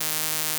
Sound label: Inharmonicity D#3